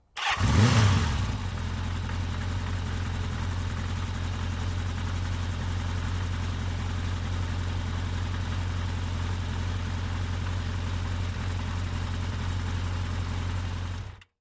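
Motorcycle, Kawasaki 750, Start Close Up, Idle, Off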